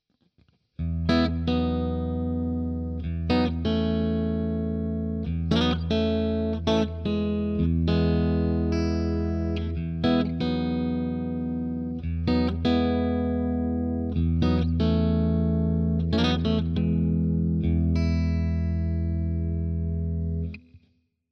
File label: Glassy Clean